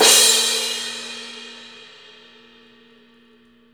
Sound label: Crash, 128bpm, Nu Dance Electro; Zero-G; Soundclash; Combi Loops; Monofonic